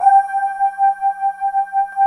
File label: VOICES G4, JX-10; Greytsounds VOL-1